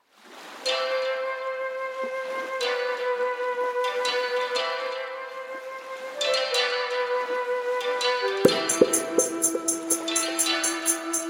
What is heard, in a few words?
dub